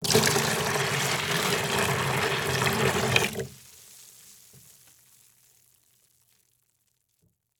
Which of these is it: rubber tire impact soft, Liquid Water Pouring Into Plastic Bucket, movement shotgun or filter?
Liquid Water Pouring Into Plastic Bucket